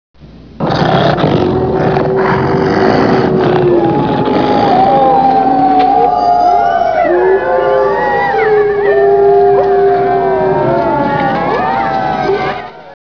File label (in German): Wolf knurren